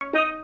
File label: Hit